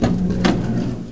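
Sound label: Elevator door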